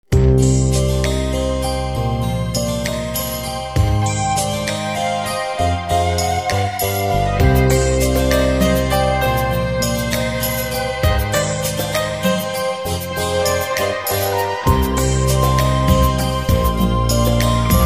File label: instrumental; persian